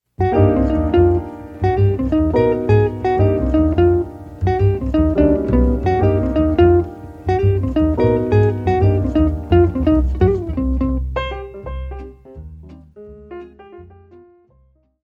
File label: Softly Intro